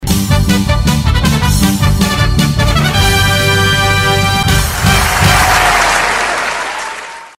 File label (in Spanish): fanfarrias con aplausos